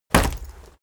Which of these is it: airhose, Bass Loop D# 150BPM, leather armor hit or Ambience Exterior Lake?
leather armor hit